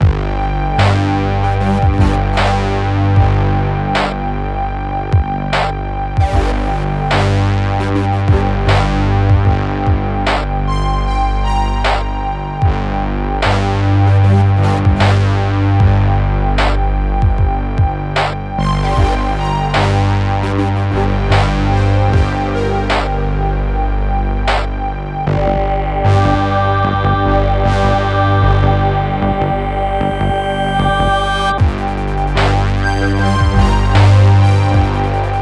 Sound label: Environment Menu; quantum runners windows gamerip 2021